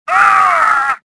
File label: pain; Star Wars Jedi Knight II Jedi Outcast; XBOX